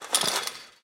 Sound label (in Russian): zvon drebezzhashego metalla